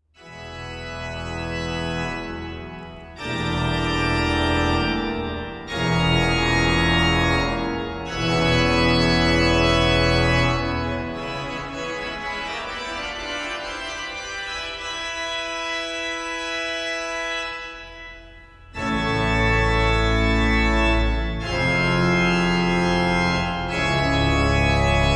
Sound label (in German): klangerlebnis orgel